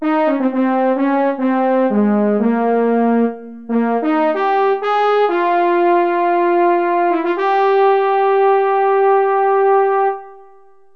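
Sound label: french horn; Roland - An Invitation To The Roland World Of Music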